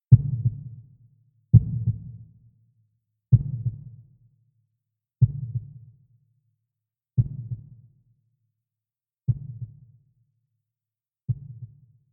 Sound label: Heartbeat Slowing to Stop